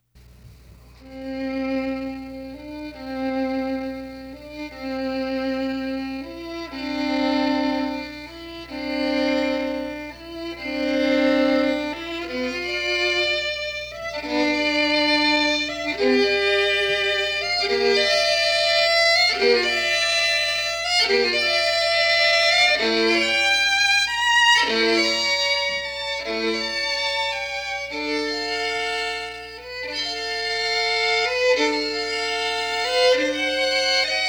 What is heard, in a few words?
Sonata in G Major for Viola da Ga